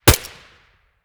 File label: fiveseven silencer mono distant, weapons